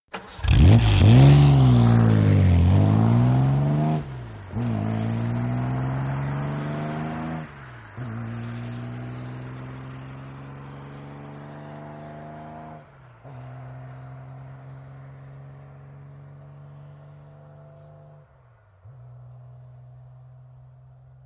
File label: VEHICLES ASTON MARTIN: EXT: Start, depart, fast, long fade.